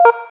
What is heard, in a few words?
sfx menu out; Playman Extreme Running